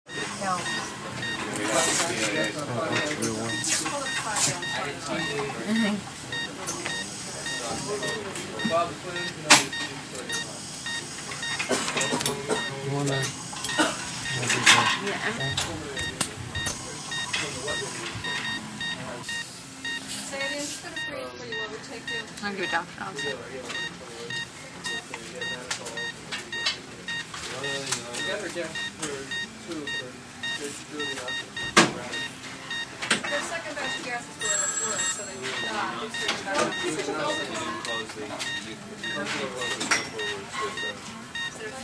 HOSPITAL EQUIPMENT HOSPITAL: Operating room, general atmosphere with chatter & clatter & patient coughing, erratic heart monitor.